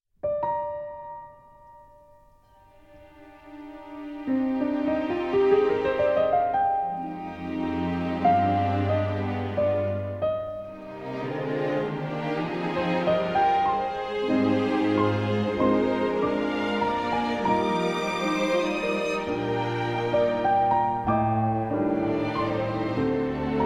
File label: Rain